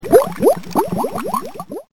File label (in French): GLOUGLOU